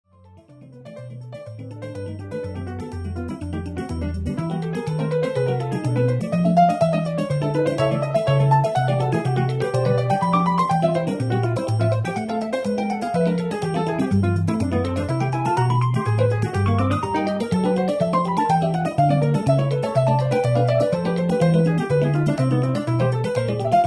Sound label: Improvisation on C